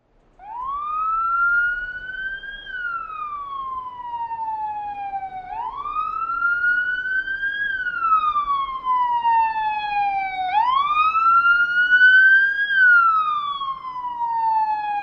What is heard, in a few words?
Police Siren: Slow Car By, with Various Types And Engine Noise.